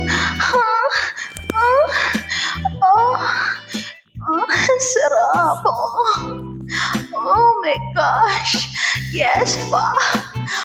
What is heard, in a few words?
grunt